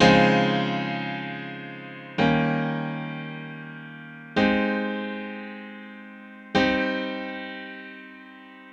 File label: Grand Piano - 110 BPM (G# Major); Mayor; Ghosthack; Free Guitar And Piano Samples